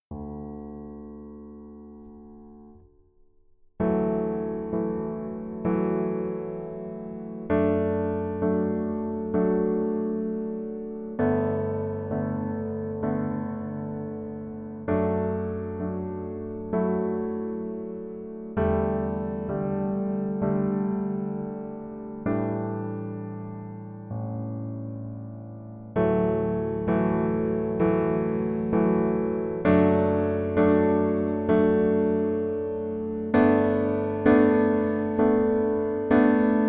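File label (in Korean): Piano version 남자키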